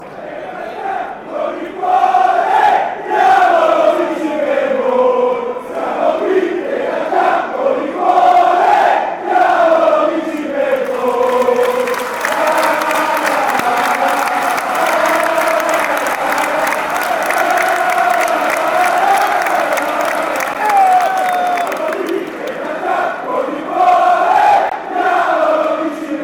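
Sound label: red heart, football chants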